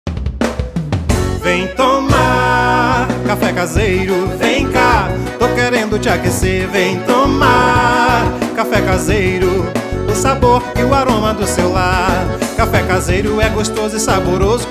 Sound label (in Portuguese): jingle café caseiro